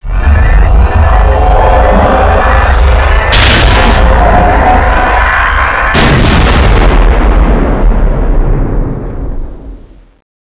crash right; valve; cinima

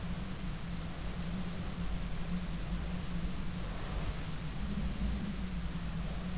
wind light

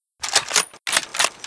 bolt, weapons; cstrike

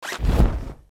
zip shoot; cstrike

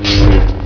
saber hit; cstrike

